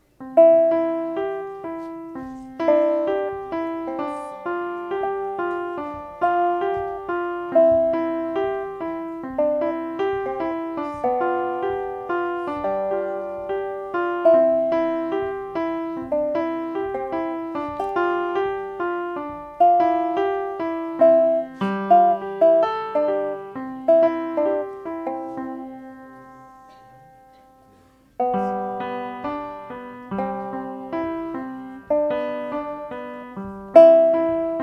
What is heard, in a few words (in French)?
Etude en duo